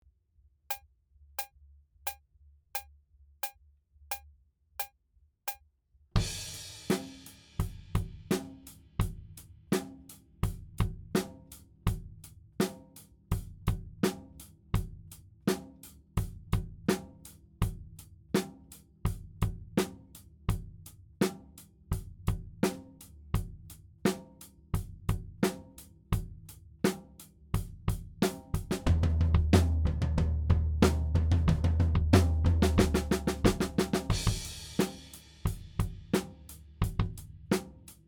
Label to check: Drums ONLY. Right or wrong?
Right